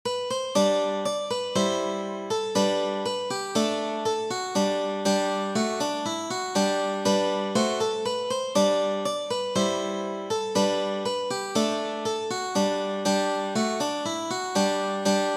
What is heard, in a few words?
Shaker March in minor